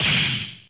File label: Punch; RedDot